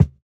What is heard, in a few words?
Kick, jungle breaks drum sounds